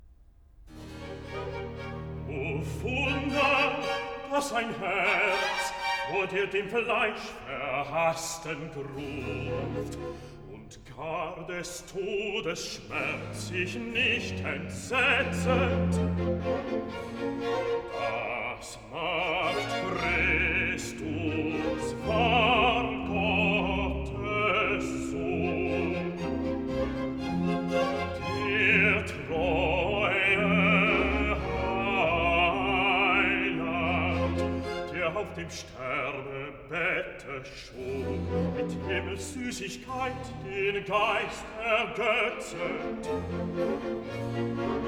Recitative & Chorale (bass); BWV 125; O Wunder, dass ein Herz - Das macht; Bach; Cantatas BWV 82, 83, 125 & 200; Gardiner

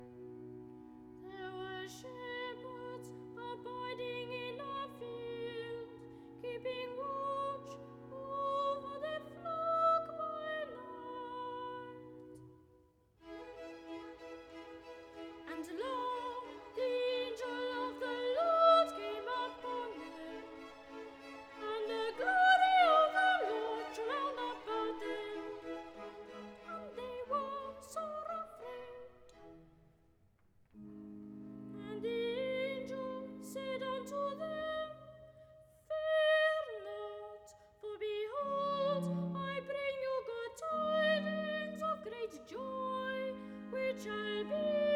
Recitativo (Treble) "There were shepherds"; XIII. Accompagnato; Messiah

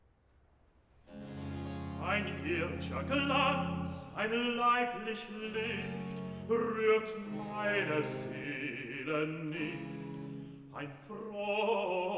Cantata, Recitativo (Bass); BWV 1 'Wie schön leuchtet der Morgenstern'; Bach; Walpole St Peter

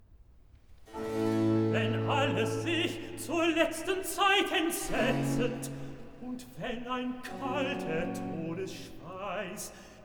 Cantata, Recitativo (Tenor); BWV 127 'Herr Jesu Christ, wahr' Mensch und Gott'; Bach; Cambridge; Quinquagesima

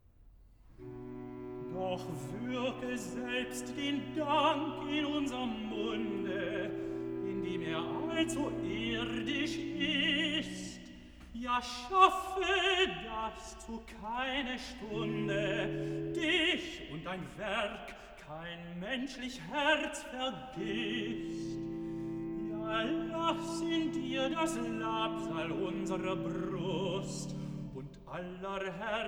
Cantata, Recitativo (Tenor, Alt); BWV 134 'Ein Herz, das seinen Jesum lebend weiß'; Bach; Eisenach; Easter Mon & Tue

